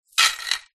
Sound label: animals dog leash metal chain set down wood floor